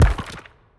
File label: symbol Landed; asgardian stones